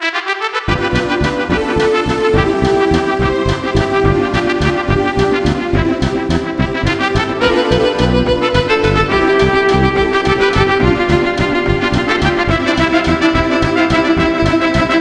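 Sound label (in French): musique; Mobiclic46; ROUTE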